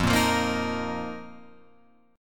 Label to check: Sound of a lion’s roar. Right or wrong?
Wrong